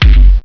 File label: BANG